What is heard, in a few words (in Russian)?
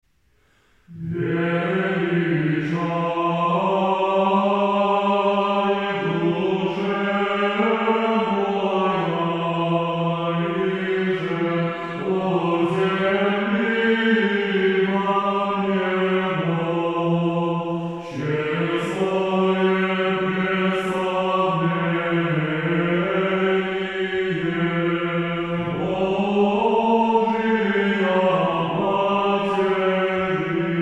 Успение 1 глас ирмос 9 песни. припевы